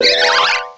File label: cry; source of the sovereign